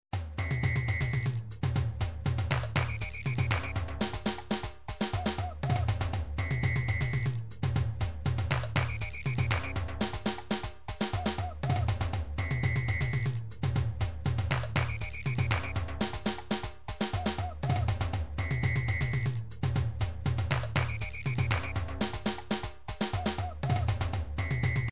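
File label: drums pattern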